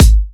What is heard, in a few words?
Dubstep Kicks